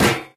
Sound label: physics turret gibs